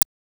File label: Moog HH; Moog Modular55